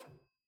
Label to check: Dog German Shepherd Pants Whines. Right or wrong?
Wrong